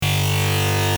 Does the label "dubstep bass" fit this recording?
Yes